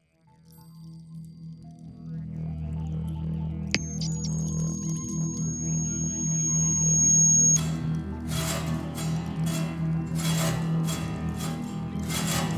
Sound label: Haunted House